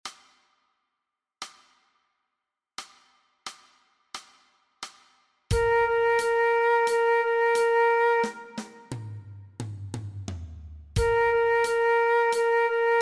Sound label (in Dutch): MEESPEELTRACK Dwarsfluit alternate; Dwarsfluit aangepast; Nij Talint Orkest